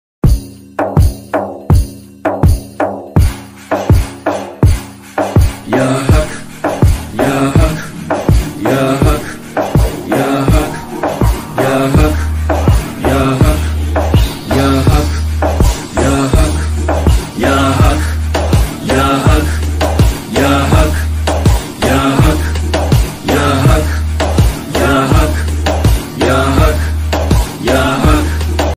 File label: turkey version ringtone